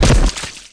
body fall mud; Spearhead Demo

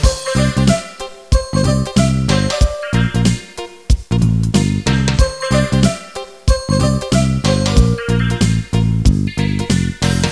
menu; Mess With 10

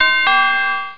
Invite; AMUG On Net; FirstClass Client

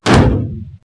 met strk, bugs; Starship Troopers

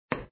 step; garfield futbol

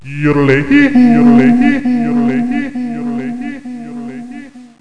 yodle

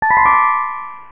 Wildcard; Kids Arcade Pak Demo; Gumballs 1.0 Demo

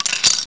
shatter

